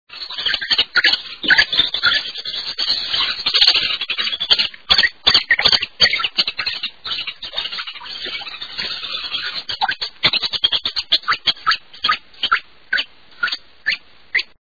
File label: Lewis Woodpecker